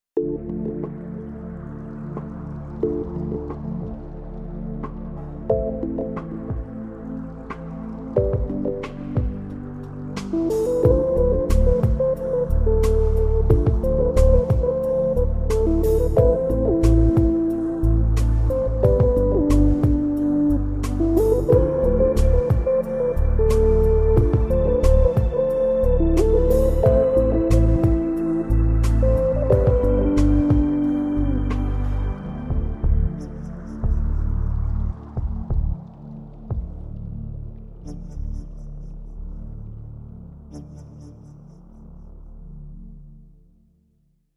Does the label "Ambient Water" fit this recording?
No